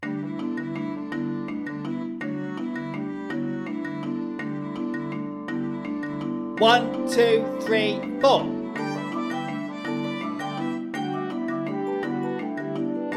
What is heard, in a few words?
Anansi song accompaniement only; Teachers' guide tracks